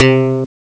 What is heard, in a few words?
bass cool, Amiga Soundtracker Sample; Amiga Soundtracker Sample Packs